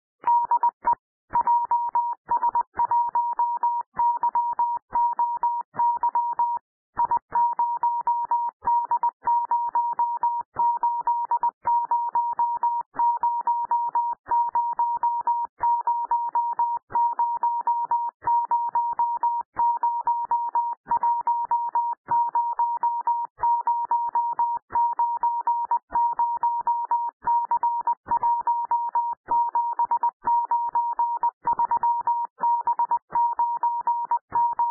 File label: cw hk beacon; アマチュア無線